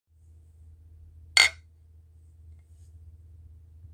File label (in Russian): chokanie stakanov